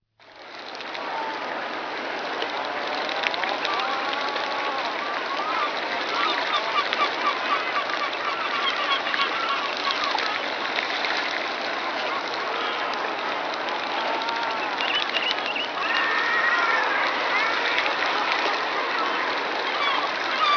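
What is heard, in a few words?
puffins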